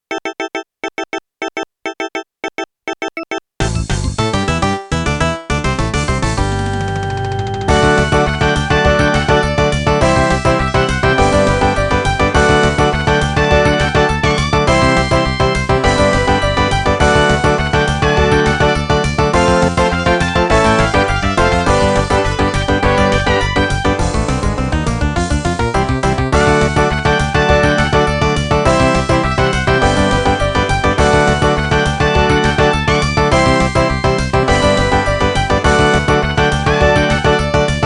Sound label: rock roll